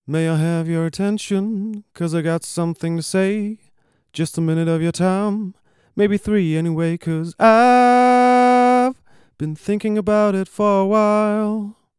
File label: akg c7 song